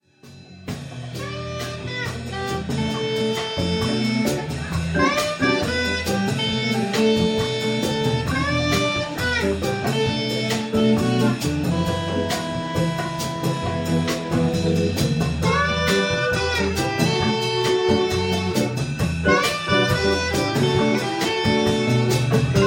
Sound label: Latin Groove